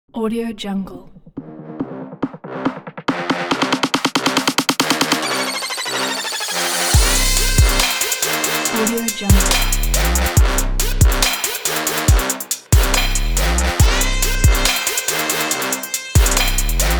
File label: sport trap intro